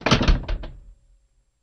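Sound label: Cutlass Interior Door Close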